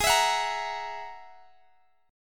G sharp 7th Suspended 2nd Sharp 5th, Guitar, Standard; G#7sus2#5; strummed; x,x,18,21,19,18; JGuitar